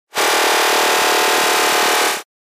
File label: Noise HF Elect Drone S